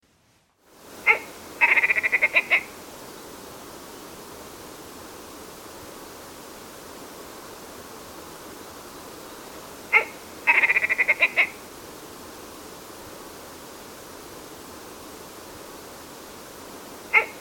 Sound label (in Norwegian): lirype